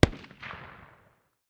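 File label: light machine gun far distance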